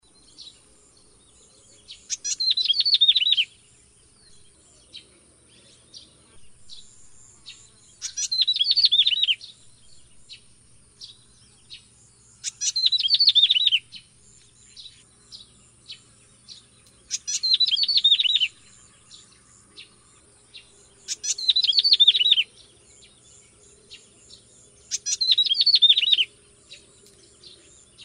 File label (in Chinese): 灰頭鷦鶯; 扇尾鶯科/留鳥